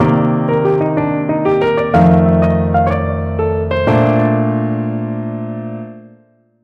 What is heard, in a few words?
ii V I jazz licks, piano